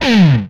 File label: Sci Fi Gun, retro arcade; Guns; MMRetroArcadeSoundsPack